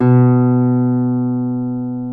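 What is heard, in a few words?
A1 CLASSIC, CLASSICAL GUITAR; Northstar - New Gold VOL-2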